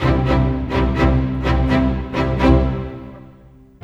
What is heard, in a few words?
Strings; Sonic Foundry (Sony Creative Software); Orchestral Series VOL-4; Rock and Pop; Rock-Pop 18